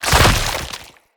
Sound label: Corrupted Slug Foley Death; Creatures; Movement